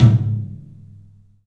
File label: TOM, 3D Percussives